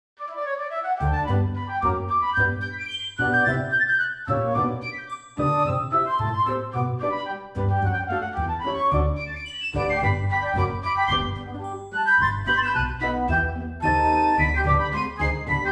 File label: Festival Of Flutes